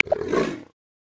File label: Jaguar, Amazon; Xiaomi Redmi-Note-8-Pro